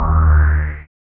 synth bend, dance synth; Sound & Vision - Gigapack I CD 2 (Roland)

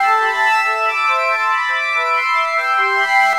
Crystaline, Synth Sequences

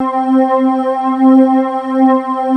SILK PAD, STRING; Q Up Arts; Dream Experience

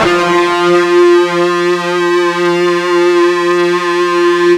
FUZZ F 2, Guitar; East West; Steve Stevens Guitar Samples Collection

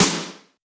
Yamaha DJX 2B, SD Elec M

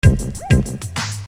Beat, Hip-Hop Street Beats

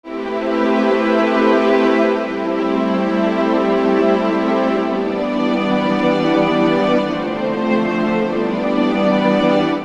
String

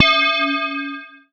C#, BELL GONG, Synclav FM; Big Fish Audio

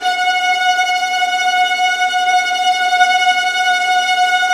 VIOLINT G#5, Synclavier Strings; ILIO

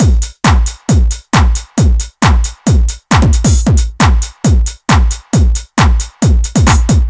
DRM, LOOPS 135, House

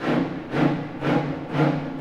Orchestral Loops; 128 PSYCHO

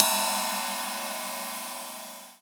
Ride Pang; EMU Orbit-3

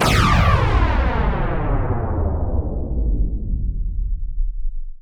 BALLISTIC, Impacts, Hits & Booms; Distored Reality

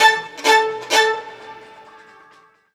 STRG; USB Soundscan vol.32; Roots Disco; AKAI

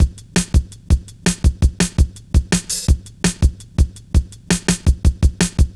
reece steppa, drum loops; Zero-G Jungle Warfare 1, 2 & 3

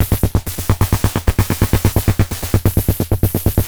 Beat, Industrial

